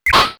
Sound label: parasol; SNES; peach; SMRPG